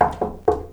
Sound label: three knocks